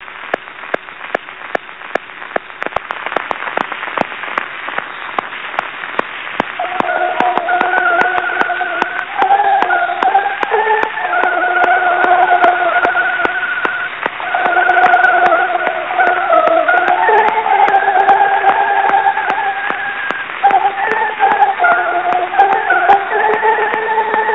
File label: Thai Royal Anthem, Phonograph cylinder recordings